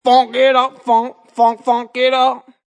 Funk vocal loop sample; 110bpm; Loop Vocal Funk ItUp 110bpm

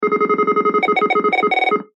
Science Fiction Readout Beeps Scrambled; Communications (Sound Effects:Science Fic

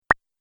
Idea Single Pop, cartoon; Cartoon Sound Design Sound Effects

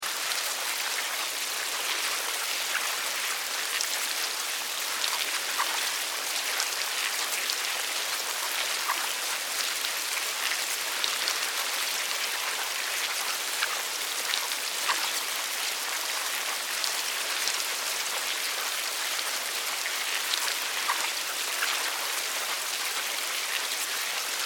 Insects Larva, Insects, Larva, Meal, Worms, Slimy; 5.1; Sound Effects:Science Fiction; BlastWave

